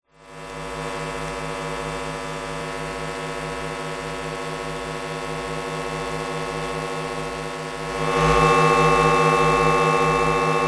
electromagnetic power station power supply

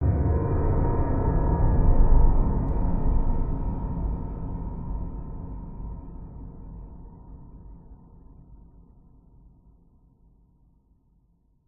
horror hit dark ominous scary

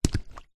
foley footstep single boot wet ground puddles

